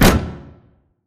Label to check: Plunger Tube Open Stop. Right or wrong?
Right